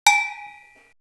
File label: Perc; Coldcut's Kleptomania VOL1